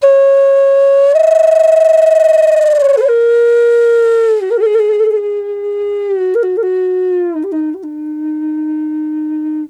EF wild flute; Big Fish Audio - Didgeridoo and Other Primitive Instruments

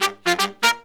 Horns, 120BPM; 90 s Sample CDs; Zero-G; Phantom Horns

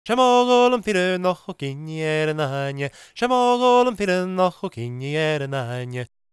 GAELIC, World Voices; ILIO; Vocal Planet

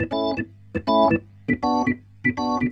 Roots Reggae, B-3; Sounds Good - Roots Reggae

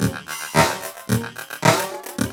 LOOP, TECHNO; TECHNO-BOX; ELEC-LOOPS

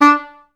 STAC D3, Orchestra; Best Service; Peter Siedlaczek's Advanced Orchestra

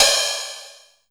hat, drum; Masterbits - Total Zone

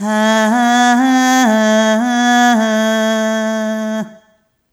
Rhythmic A

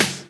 CLAP, Dance Drums

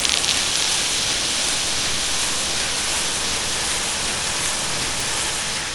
EURO TECHNO, loop; Sonic Foundry (Sony Creative Software) - Future Retro Dance Excursions

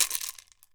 COW RATTLE; AM IND PERC; AKAI S6000 CD-ROM - WorldSounds

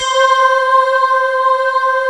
CHRIST C5, Choir; USB Soundscan vol.28 - Choir Acoustic & Synth; AKAI

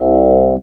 Talk Box AH

